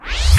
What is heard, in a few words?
Scratch, Roland JV-2080